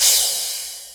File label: Cymbals